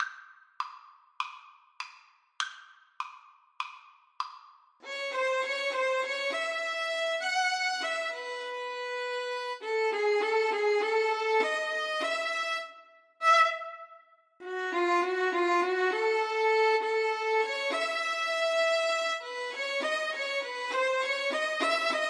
VLN, Violin Duet

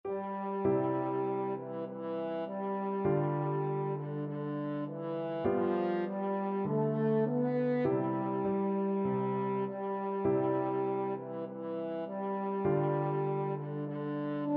america the beautiful, french horn

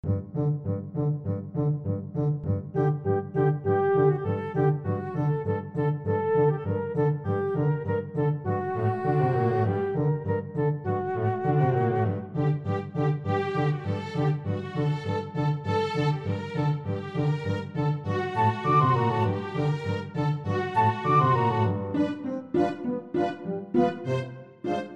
danses des cygnets, oboe

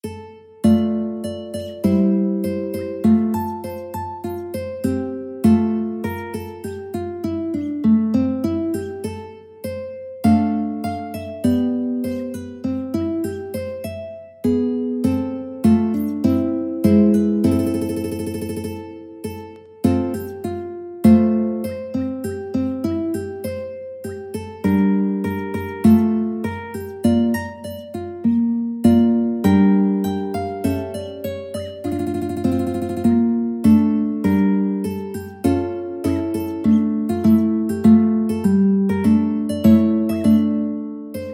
mandolin

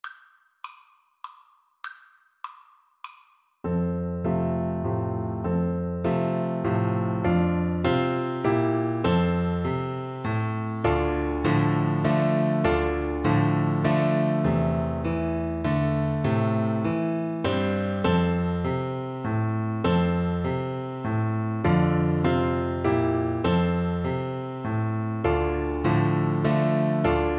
waltz, Bassoon

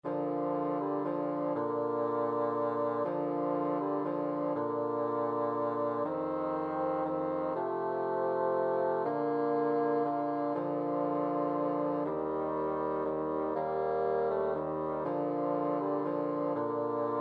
silent night, bassoon trio

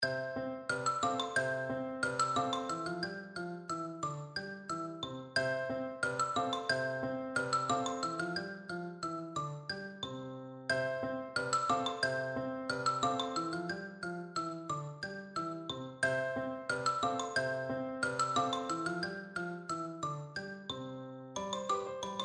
percussion

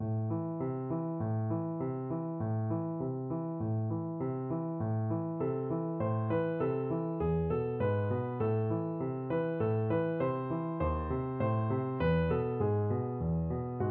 Rach Piano 3 piano

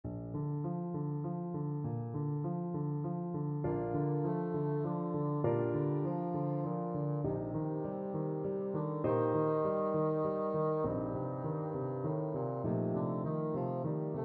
bassoon

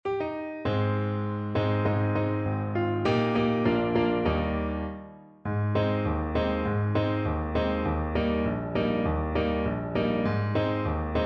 the gospel train, Trumpet

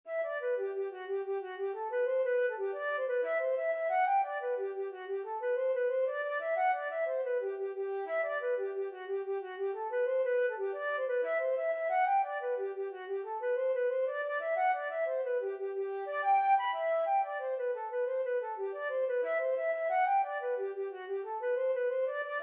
flute

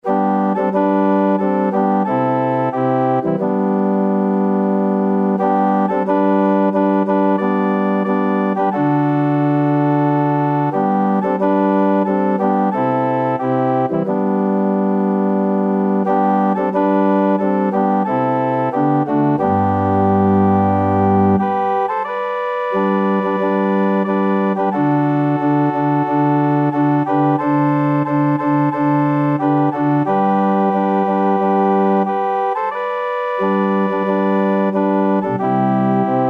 in the sweet, organ

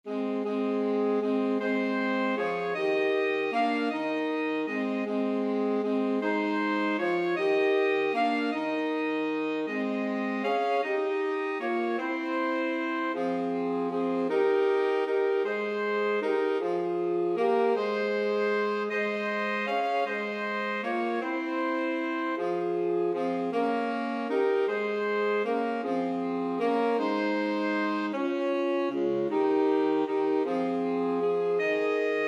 in dulci, 2 altos tenor sax

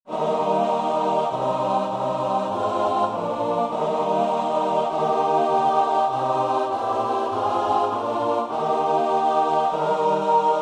fairest lord jesus CHOIR

